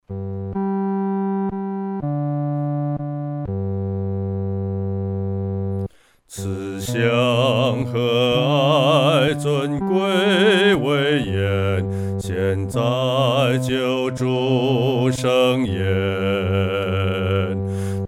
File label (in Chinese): 独唱 第四声